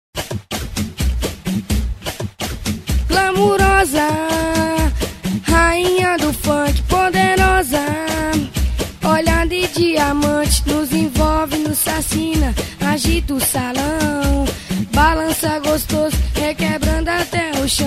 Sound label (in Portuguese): funk